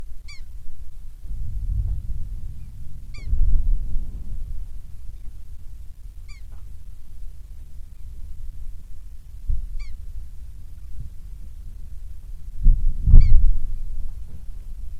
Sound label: Muldrow surge low-frequency rumble with pika calls; alaska acoustic highlights; GEOPHONY